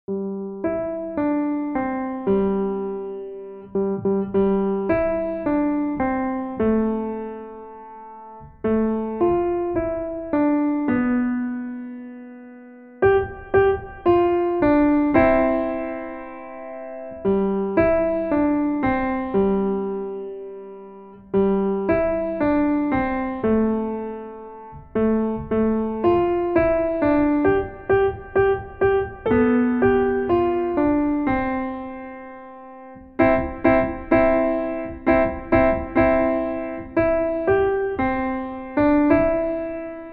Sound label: Jingle Bells, student recordings